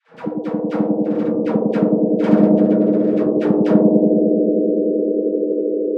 snare

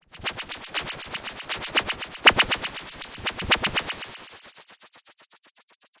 snare 8Hz sine

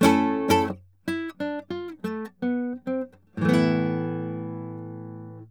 nylon stacato mic; kaikukoppamallinnus

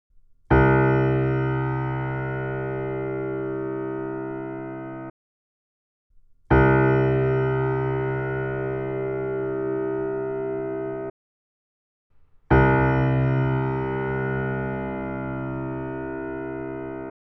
Key, piano pedal

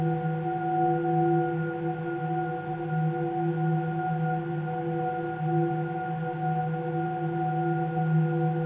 noise

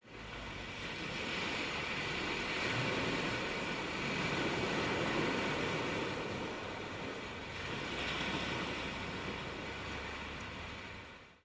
sea unprocessed binaural; halton

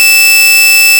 trivial sawtooth

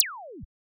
impulse response

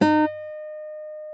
Flageolet Half; flageolets

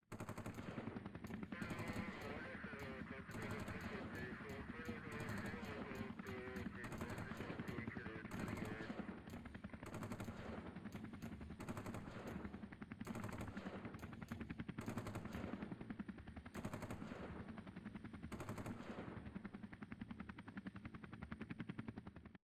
Pan three Static Sources Bin

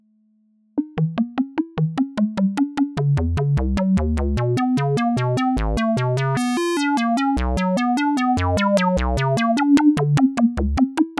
LPG LP square

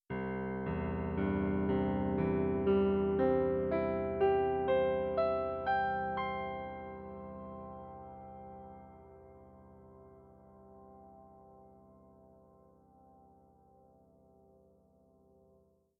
C arpeggio; pedal

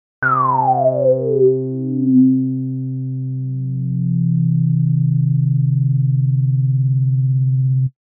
sweep osc a; moog

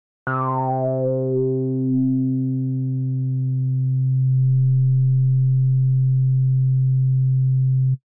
sweep osc c; moog

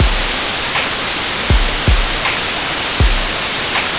white noise w drums no ducking

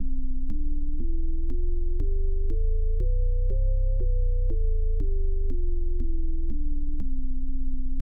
c major scale bass leslie slow, hammond

